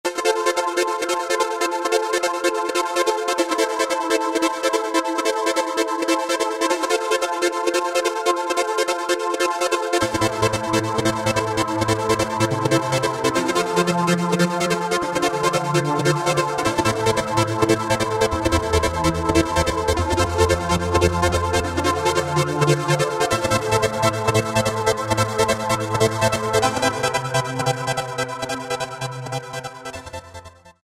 Cyber-Trance; Roland SR-JV80-11 Techno Collection

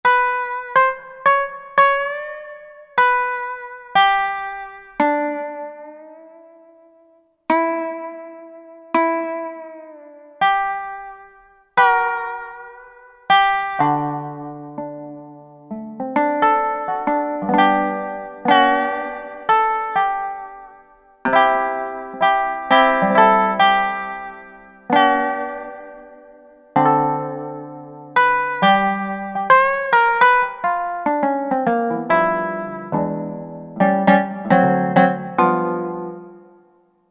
Resonator Gt; Country; Roland SR-JV80-17 Country Collection

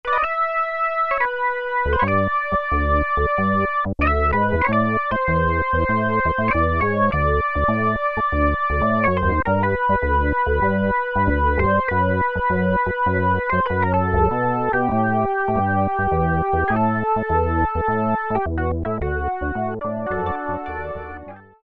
Organ II, Roland Juno-106; Factory Preset

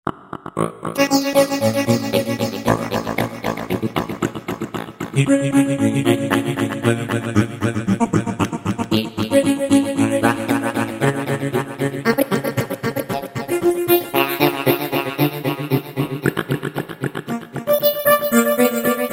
Voices Of Afar, Roland V-Synth; Factory Patches

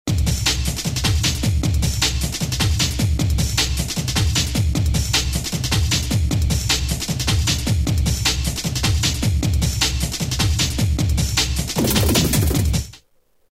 Darkman 154, Roland M-DC1

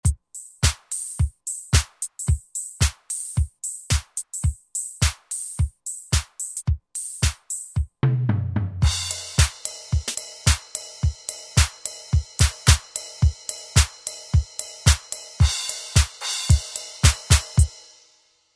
Dance SET, Drums; Roland SR-JV80-10 Bass & Drums

